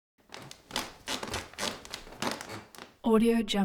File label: wood creaks